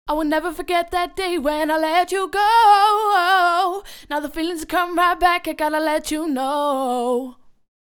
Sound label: dance vocals 120 Cm; Sample Magic White Label Dance Vocals